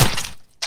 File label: blood splatter